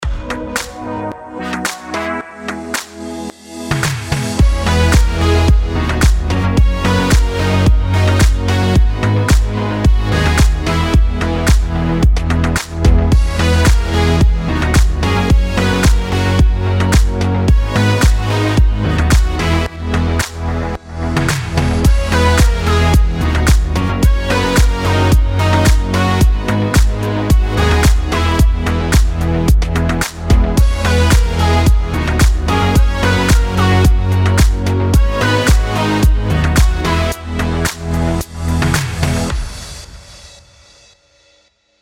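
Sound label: POP E major 110BPM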